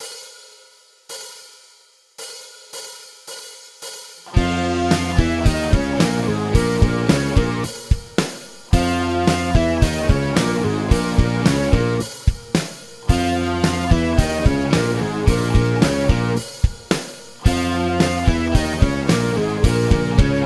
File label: Riff Band, guitar